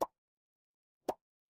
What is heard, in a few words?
cartoon pop